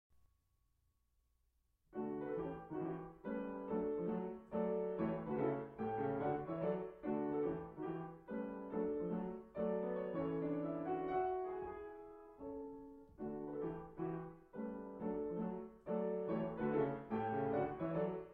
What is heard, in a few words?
Piano